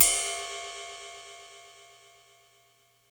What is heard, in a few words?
ride single hit c key, Royality free ride single hit tuned to the C note. Loudest frequency: 8818Hz